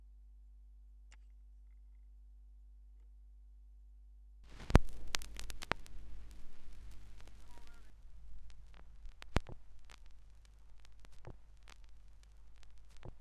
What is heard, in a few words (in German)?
schallplattengeraeusche; rauschpartikel